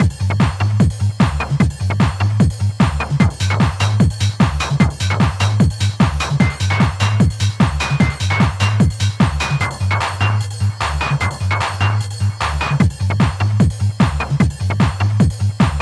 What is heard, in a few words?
Mechanical Style